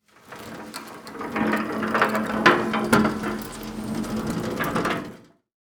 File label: Emergency Gurney Hospital Gurney Rolling Down Hallway; emergency effects; AdobeCC